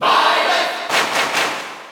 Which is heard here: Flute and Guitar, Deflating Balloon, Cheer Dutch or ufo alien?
Cheer Dutch